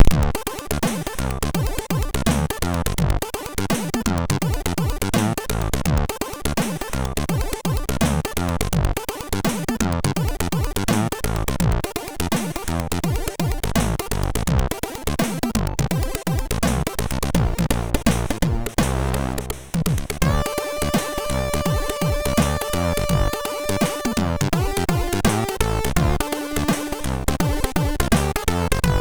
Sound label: MOS6581R3, sid; Starburst